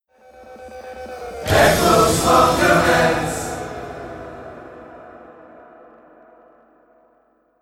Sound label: acousmonuments chorus; acousmonautics